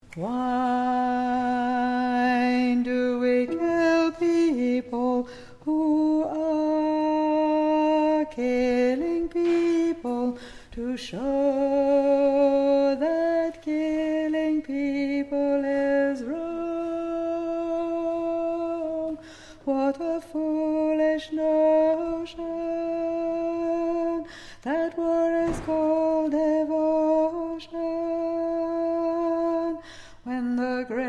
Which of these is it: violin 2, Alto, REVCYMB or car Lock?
Alto